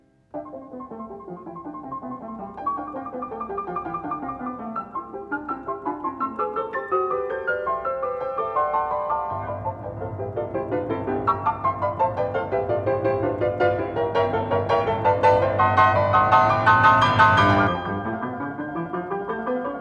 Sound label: Presto, C major, piano; Beethoven; Diabelli Variations in C major, op.120; Brilliant Complete Beethoven Edition